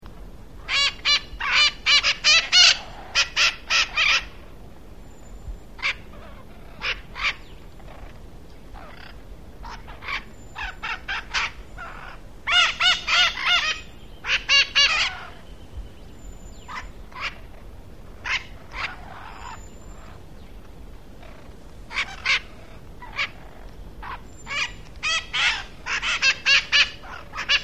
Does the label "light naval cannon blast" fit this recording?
No